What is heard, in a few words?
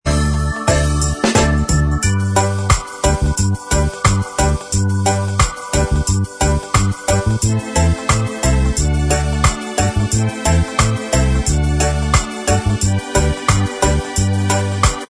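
instrumental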